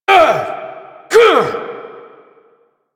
Parry Finisher Vocals; Enemies; Finishers; Drifter; Warframe; Duviri; Dax

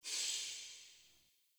UI Progression Category Fade In

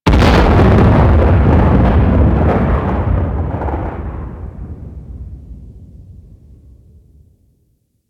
Explosion Space Battle Distant

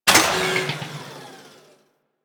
Gorgon Fire Mech, Weapons; Warframe

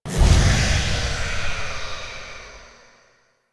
Angel Mist Spawn; Warframe; Missions; Zariman; Zariman Assassinate

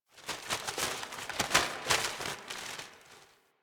Paper Blowing F; Warframe; Ambience; Grineer; Carnival

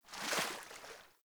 Surface Body Roll Water; Player; Surface Body Impacts

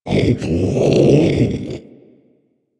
Predator Vocal Captured Idle; Warframe; Hunting Critters

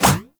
Baby Step; Warframe; Nokko Colony; Visions

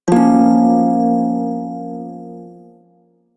Prime Shawzin Hirajoshi Chord C; Music; Warframe

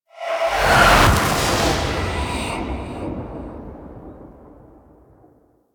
Fly By Quick, Vehicles; Zariman Liset; Flight

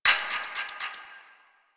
Hack Minigame UI Time Tick E

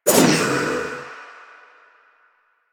ball throw foley; Warframe; Stalker

